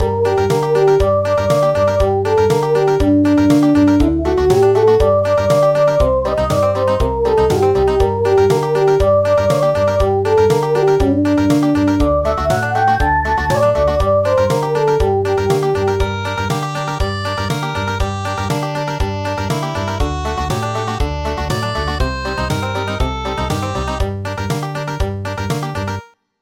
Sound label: Country; Canaries